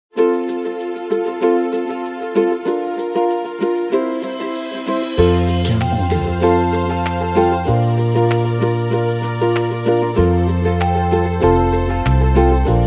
Happy Romantic Acoustic

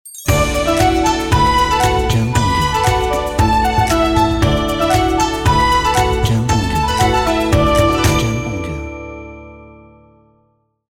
Chinese New Year Logo

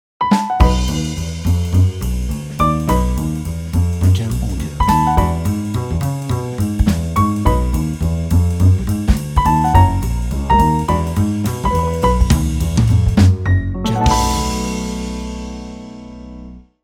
Jazz Happy Swing Intro

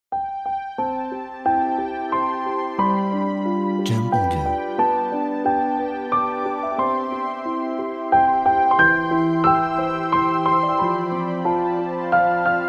Happy Birthday Soft

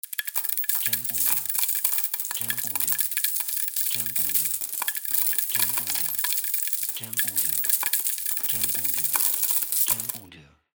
Falling Coins

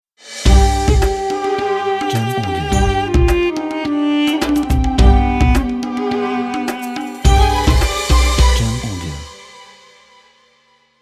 Ramadan Logo

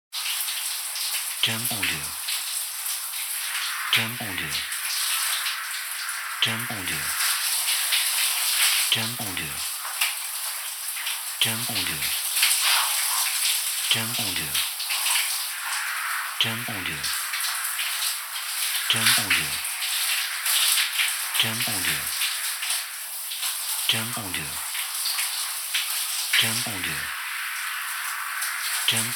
Showering

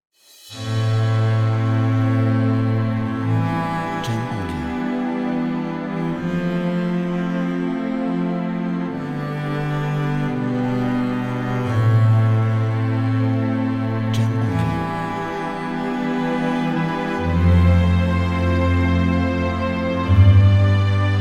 Cello Strings Backsound

